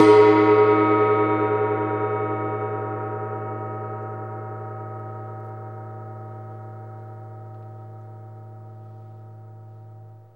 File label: Gong; Zero-G Ambient 1; GONGS